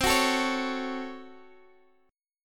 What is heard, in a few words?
Db Major 7th Suspended 4th Sharp 5th C